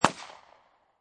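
Pistol Smith & Wesson P99 40 Cal Single Shot Exterior At 45'; Gun / Pistol: Smith & Wesson P99, 40 Caliber, Single shot, Exterior, at 45 feet distance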